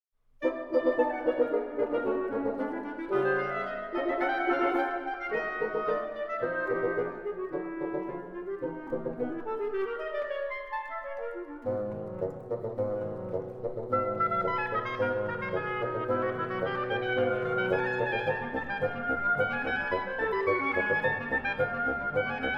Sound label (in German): Kleine Kammermusik fur funf Blaser